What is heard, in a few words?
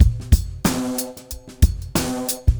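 92 bpm, beat loops; Zero-G; Monster Beats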